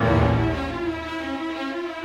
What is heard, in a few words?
Orchestral Loops; 128 MANHATTAN